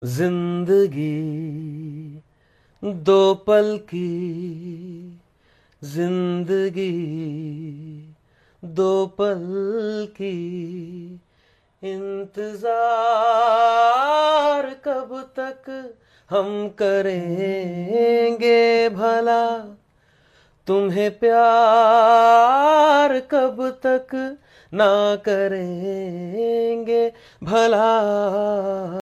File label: live on cam hindi ringtone